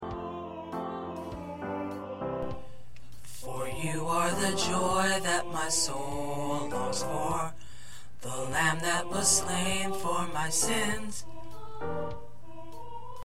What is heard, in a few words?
Tenor, choir parts